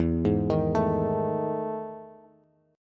E Major 11th down Bass; EM11 arpeggiated; JGuitar

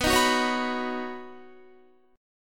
B Major Add 9th, Badd9 chord, Guitar; strummed; JGuitar

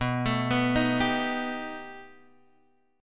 B Augmented 7th B down Guitar Open G

